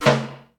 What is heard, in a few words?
Menu Select